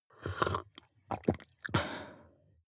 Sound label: drink glass milk slurp small